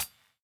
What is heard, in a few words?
NY HH, Drums Dance & Groove; USB Soundscan vol.11; AKAI; NYORK KIT